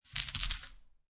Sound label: Dice Grab Collect Five Dice From Felt; Dice Sound Effects; Dice (Sound Effects:Casinos)